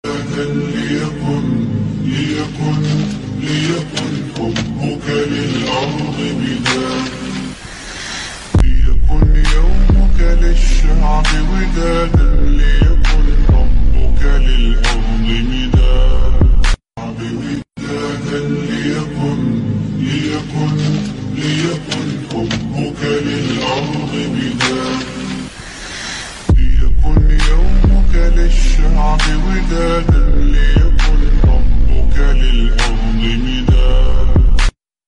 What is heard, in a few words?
sad islamic arabic